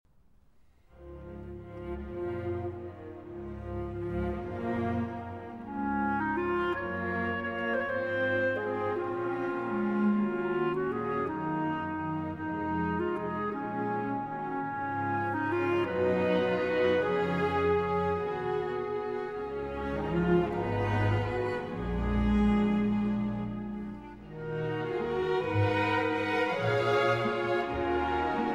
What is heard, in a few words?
Bagatelles, Clarinet & Strings, Forlana; 5 Bagatelles, Op. 23a; No. 4; Allegretto